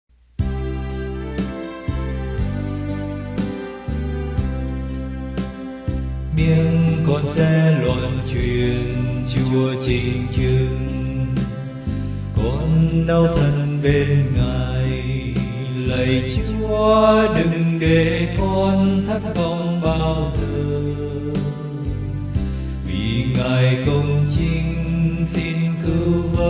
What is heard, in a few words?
BASS